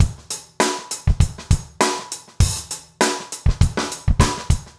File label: basic funk